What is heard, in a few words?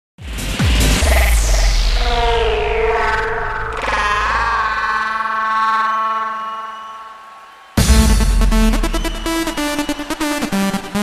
Technorocker